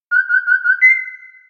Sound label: Whistle, notifications